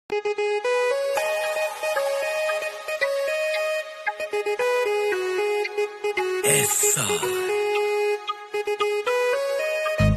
instrumental ringtone dj ringtone flute ringtone romantic ringtone